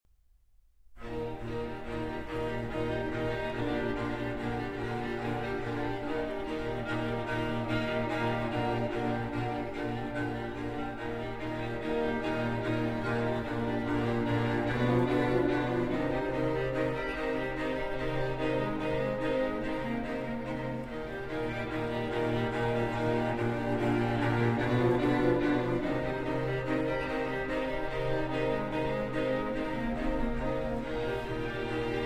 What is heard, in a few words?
String Quartet